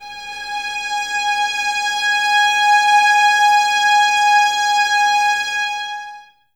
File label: violins G#5, string ensembles; Miroslav Vitous; 11 Violins